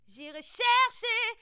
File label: synth Growl Voice; roughness